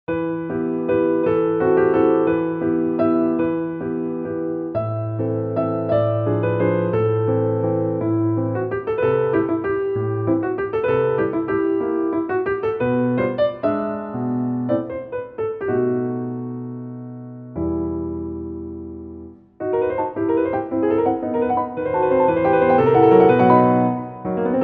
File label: piano; grieg